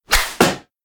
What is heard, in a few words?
notification; samsung Aleart